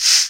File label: Shaker Sound Sample E Key, Royality free shaker sound sample tuned to the E note.; percussion